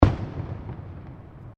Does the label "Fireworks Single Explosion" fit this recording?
Yes